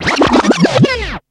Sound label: scratch rewind